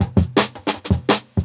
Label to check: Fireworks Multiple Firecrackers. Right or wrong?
Wrong